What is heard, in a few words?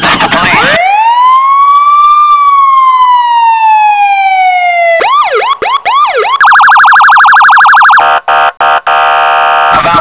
police; tfc